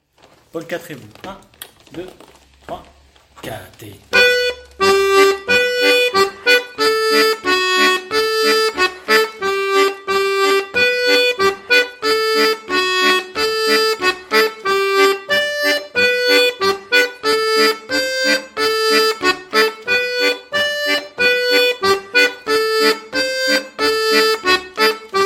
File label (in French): Polka trecou